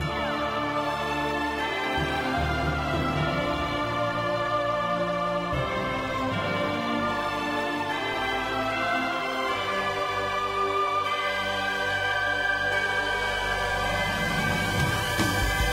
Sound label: BGM Dungeon Hard